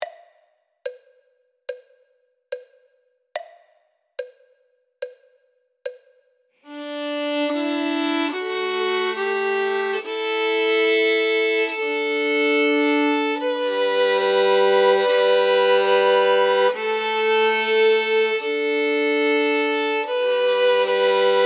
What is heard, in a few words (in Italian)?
violino I e II